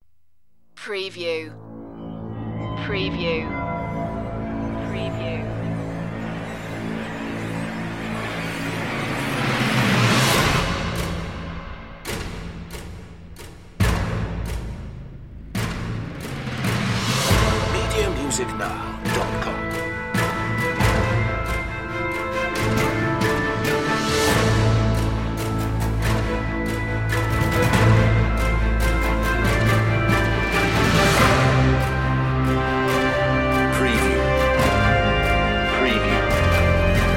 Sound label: orchestral action